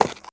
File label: horse footstep concrete hard; Rust